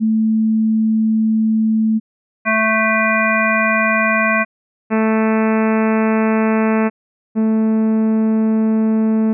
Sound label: same pitch different timbres, wavetable synthesis